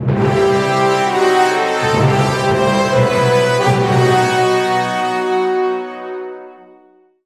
Stinger; epic mickey wii gamerip 2010; DarkStinger

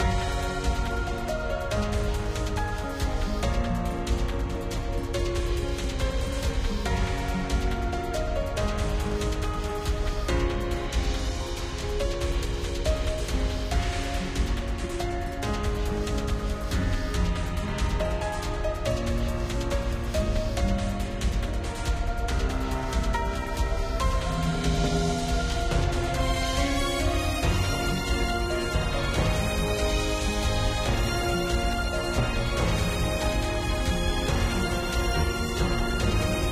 bgm guild battle; princess connect; android game music

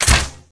Crossbow

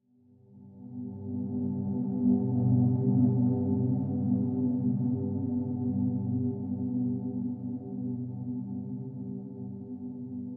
Swell Tone; HDD Theme Scull Swell Tone; inside

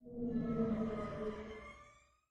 Ship Wind

